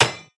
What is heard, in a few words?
Tnt Box Frame Break; angry birds rio